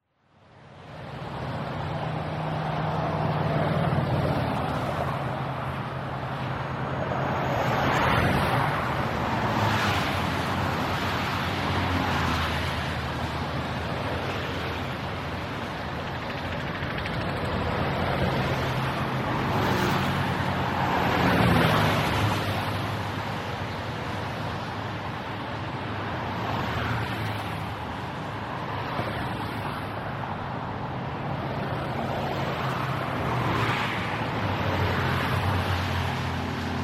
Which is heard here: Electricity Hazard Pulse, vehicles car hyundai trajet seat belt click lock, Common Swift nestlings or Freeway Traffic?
Freeway Traffic